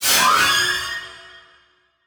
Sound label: Transition Metallic Blast